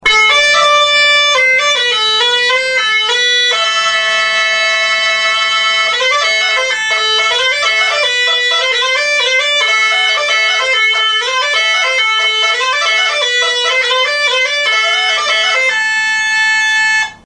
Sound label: alboka; instruments